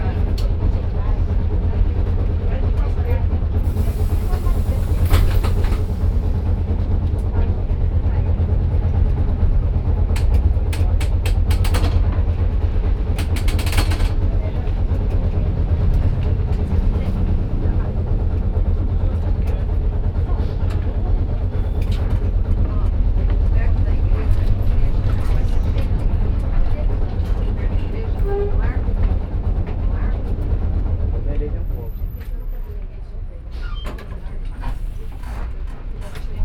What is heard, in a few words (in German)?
Im Elektrobus, Fieldrecordings Brasilien